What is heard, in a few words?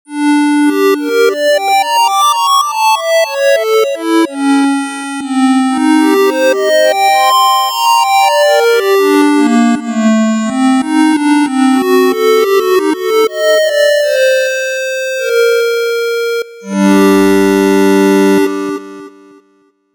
8bit Music; Super Neptunia RPG Switch 2019 gamerip